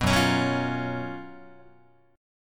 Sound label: F sharp Major 7th Suspended 4th, Guitar